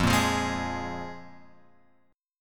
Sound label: F Minor Double Flat 5th, guitar chord; Fmbb5; {1 1 3 1 X 4} strummed